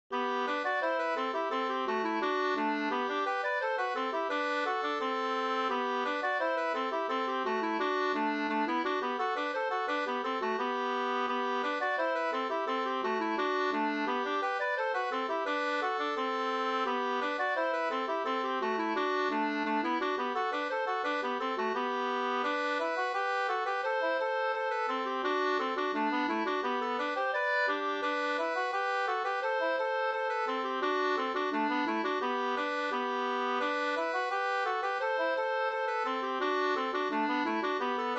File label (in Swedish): engelska